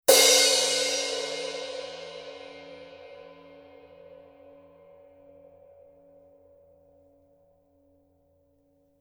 Color Sound 900 Black Heavy Crash Paiste

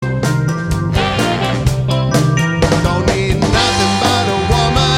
Yamaha YTS23 tenor sax

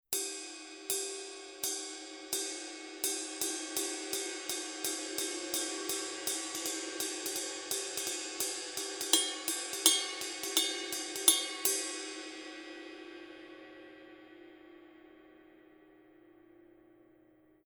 Color Sound 900 Blue Ride Paiste

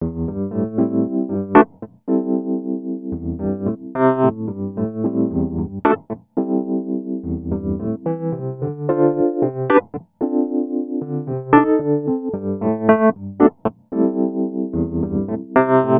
Yamaha Reface CP

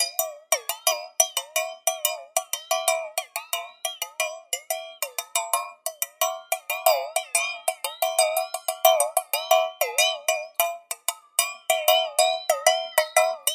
Meinl Liquid Triangle; TRIL

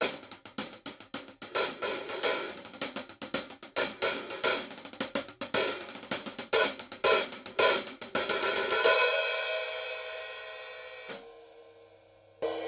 Paiste PST8 Set Hardstick

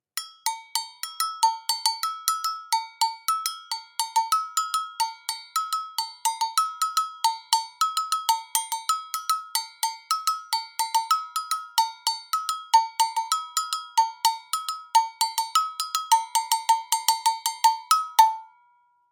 Meinl A Go Go Bell; STBAG1

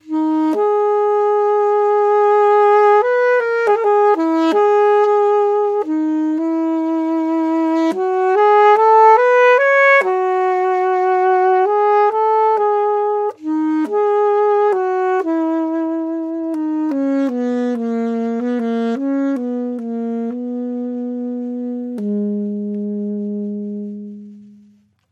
Jupiter JAS700Q Alto Sax

